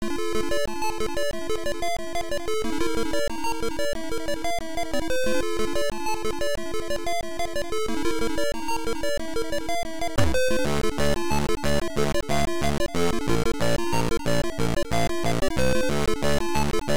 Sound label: Hardcore